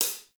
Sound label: Metronome Sweet Spot Tick; Warframe; Temple